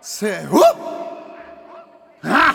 say woo hurh; ooh ahh; ho hoo woo

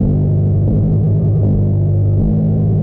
bass, Loops

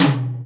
Toms, Real

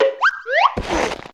banana slip; Crash Bang Boom

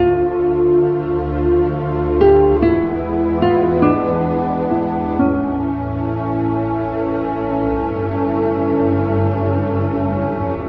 guitar and strings, Sad; Loops

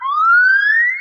gibbon; Zaps and Beeps

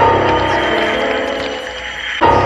piano and keys, Sad; loops

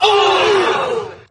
"ooh" group of men; ooh ahh

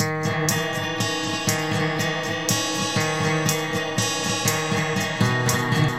guitar, Jazzmatazz Loops

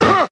huh, Voice

